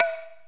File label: DX-7 PERC